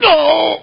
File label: hit; BattleSNDS